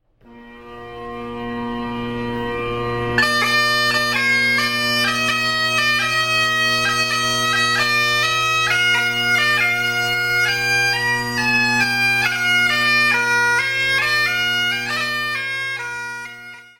Notre Dame, bagpiper

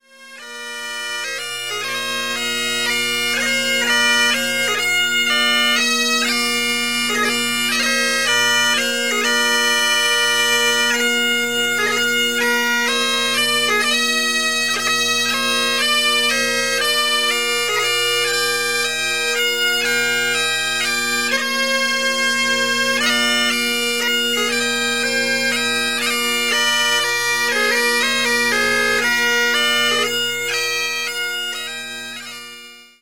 Hyferdol Duet, bagpiper

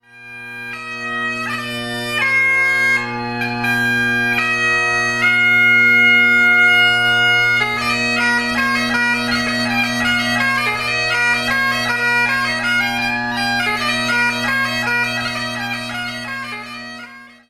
Happy Birthday, bagpiper

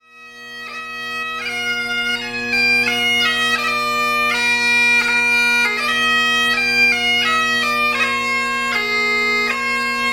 Ash Grove, bagpiper

